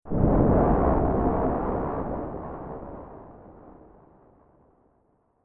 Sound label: Explosion Warfare SciFi Tonal Large, Explosion Large Tonal Blast Distortion; Explosions